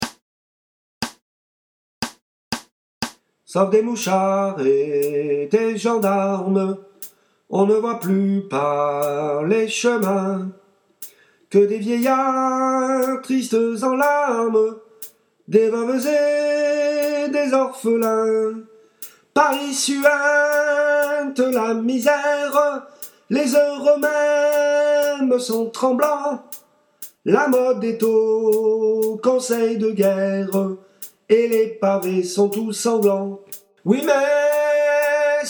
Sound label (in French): Bas; semaine sanglante; chorale